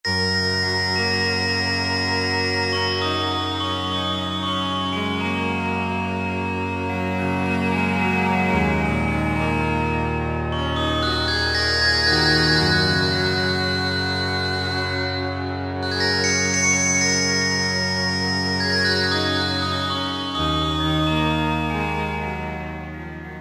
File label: DELICATE PAD; Akai SG01v